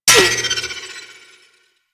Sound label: parry bullet; Warframe